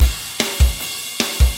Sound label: metal